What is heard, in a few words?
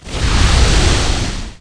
ERUPT; ShadMast